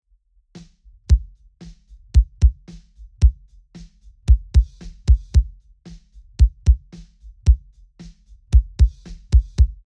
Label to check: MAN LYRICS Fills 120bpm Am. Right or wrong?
Wrong